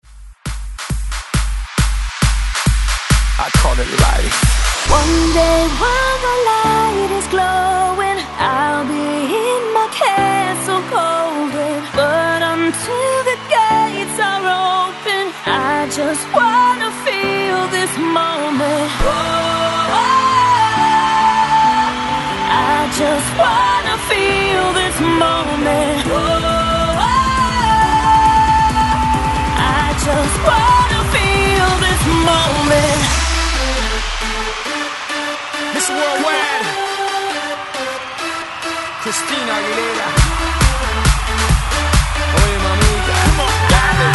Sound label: ReDrum 128 Bpm